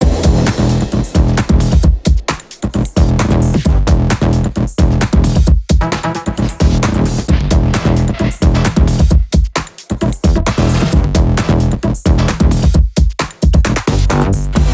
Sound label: Rocket Menu Loop; rocket wars